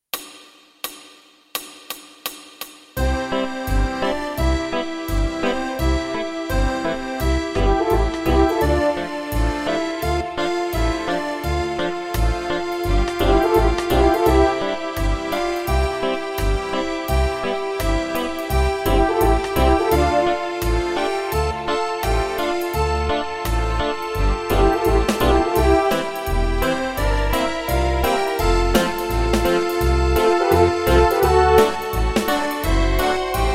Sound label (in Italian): Fisa tutti